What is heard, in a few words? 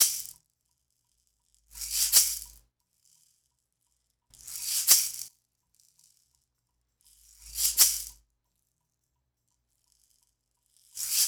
Shakers, 70s Analog Funk; Sonic Foundry (Sony Creative Software); What it Is! 70s Analog Funk